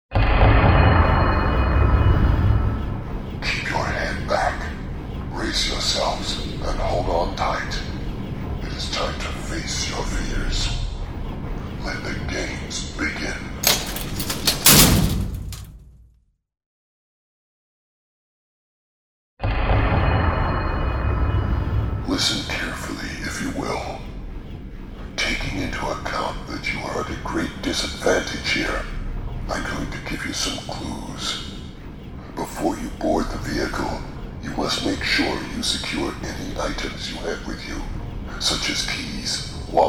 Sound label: Ride Start; SAW